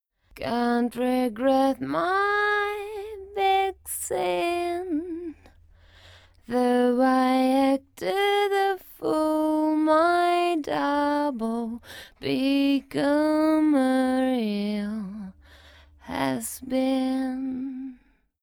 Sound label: solo vocal